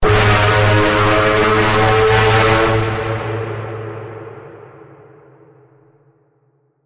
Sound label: Full Bend, G sharp